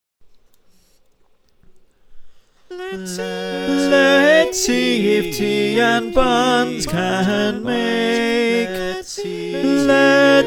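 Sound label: Tenor, choir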